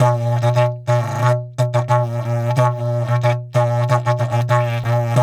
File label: Didgeridoo; DIDGEHORN2